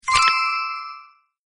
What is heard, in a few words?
success